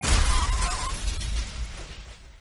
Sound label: car crash; traffic command